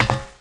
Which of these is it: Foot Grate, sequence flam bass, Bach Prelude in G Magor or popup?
Foot Grate